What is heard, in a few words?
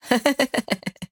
Laughing; devour